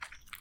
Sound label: Wet footstep; Phasmophobia